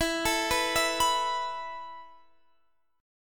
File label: E Suspended 4th E, down, Guitar, Standard; Esus4 arpeggiated